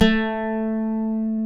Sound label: PLK A2 Bouzouki, Greek; NorthStar - Global Instruments VOL-1